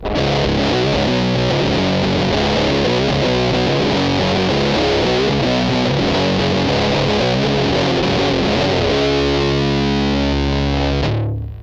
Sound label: tone milieu stock bigmuff; tonestack bigmuff